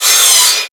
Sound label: Miter Metal Impact; Warframe; Tenno; Hit Effects